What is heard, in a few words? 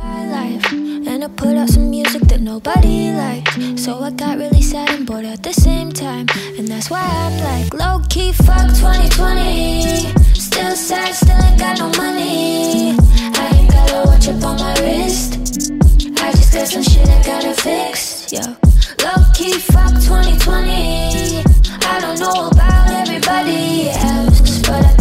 English Ringtone